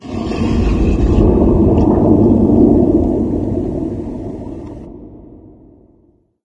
amb muffled lo mach

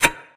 button click release; Left 4 Dead; Source Engine

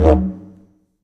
taunt didgeridoo stop; video games; source engine; tf

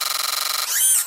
gunslinger three hit, weapons; tf

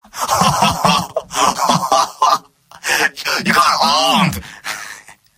laugh happy; scout; video games; source engine; tf; mvm

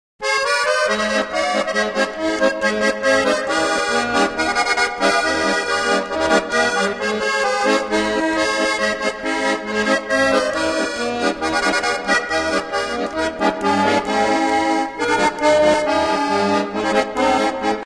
squeezebox music; video games; player; taunt; source engine; tf

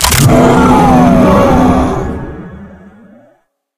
powerup pickup strength; Source Engine; tf

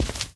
walk tile, footsteps

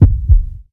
heartbeat loop

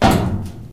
metal grate, footsteps, infected, walk; player; source engine; left 4 dead 2

